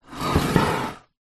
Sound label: household trashcan bin plastic movement scrape floor